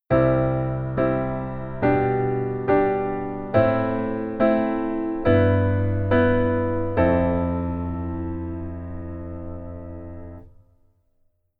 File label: Keyboard Rhythm Basic